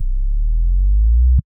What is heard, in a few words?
808 Drum Rev; SSO 808 Drums; SOUNDZ N KITZ; SSO Kit vol 2